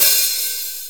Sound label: snappy open hat c key, Royality free open hat one shot tuned to the C note. Loudest frequency: 9382Hz